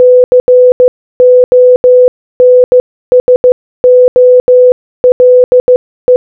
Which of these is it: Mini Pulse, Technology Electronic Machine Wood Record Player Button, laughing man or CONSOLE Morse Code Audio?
CONSOLE Morse Code Audio